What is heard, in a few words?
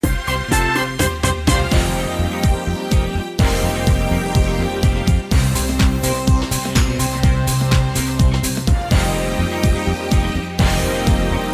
DISCO